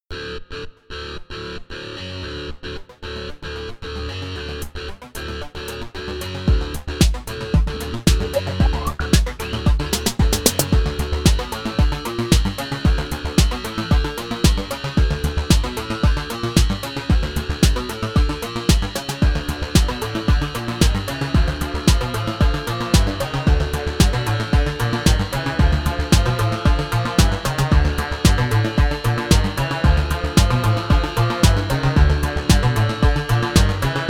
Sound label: beats electroshock